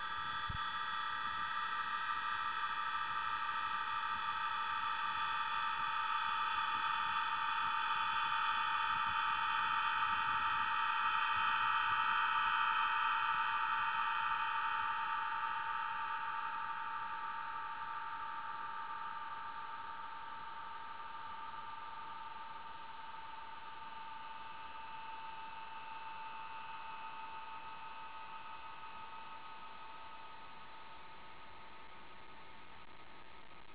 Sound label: harp